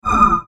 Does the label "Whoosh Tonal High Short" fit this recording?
Yes